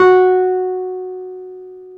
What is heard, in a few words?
PNO, Wurly